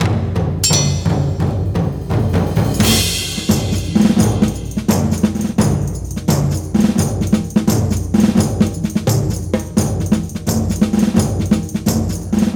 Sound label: Music Berserk Percussion; 7 Mages